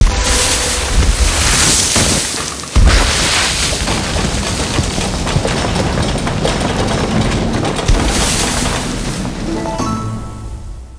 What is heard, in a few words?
Building